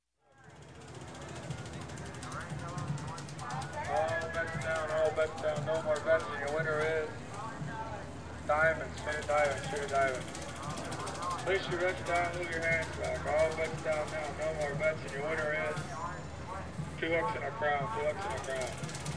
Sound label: Fair, Amusement Park Crown And Anchor Wheel; Sound Ideas 2000 Series